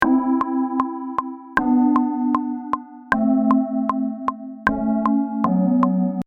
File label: Synth